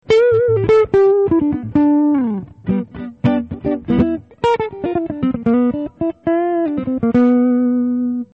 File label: neck clean; Neck Pickup, CLEAN; pickups; johnson